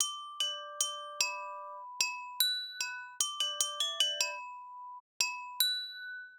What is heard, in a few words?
Gamelon Bells, 075 Bmin, Hip Hop Exotica; Apple Loops; Big Fish Audio